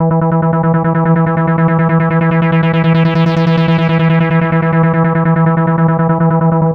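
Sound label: SYNT -L, synth, Drum & Bass, 145 BPM; Ueberschall; Drum & Bass Resonance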